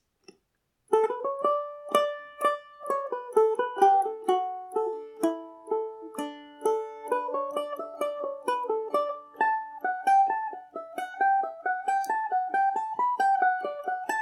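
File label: D major, irish mandolin; carolans concerto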